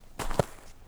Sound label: FOOTSTEP Trainers Snow Compact Walk Slow; Phasmophobia